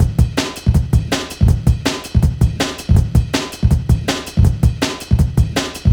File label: Loops, breaks; m8 breaks